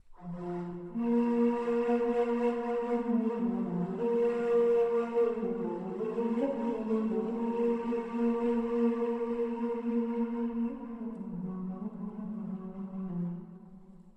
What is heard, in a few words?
ney